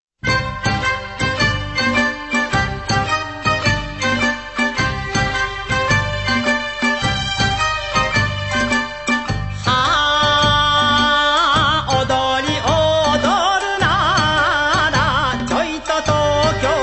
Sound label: Bon Odori